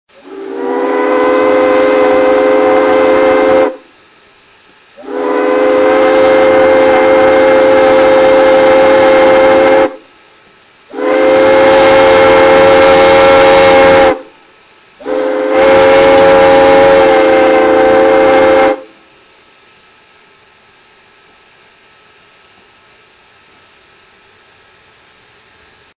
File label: full speed, steamship sound; steamship sound mock-up